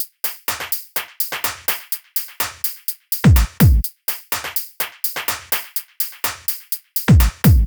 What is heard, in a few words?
125BPM TOP LOOP; Top Loops